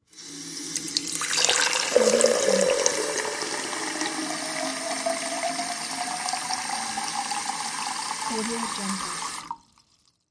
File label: pouring liquid; pure-noise